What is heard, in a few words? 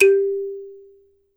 THUMB PI, Kalimbas; E-MU Producer Series Vol. 7 – Old World Instruments